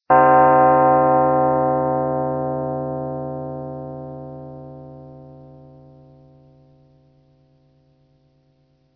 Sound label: death bell